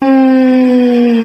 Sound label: mmm